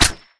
snap; garrysmod

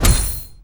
splinter; garrysmod

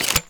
ks23 insert; garrysmod

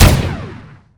energy gun; garrysmod

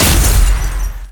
freeze break; garrysmod

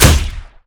bow; garrysmod

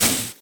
gas hurt; garrysmod

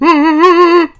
zombi idle; garrysmod

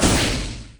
flamer start; garrysmod

